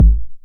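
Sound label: 909 BD tape, kick drum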